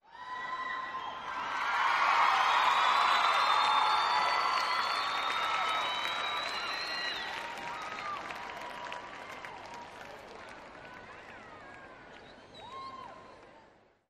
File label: Crowd ( 30, 000 ), with Whistles / Applause, Starts / Surge / Dies. Cheers Crowd, Huge.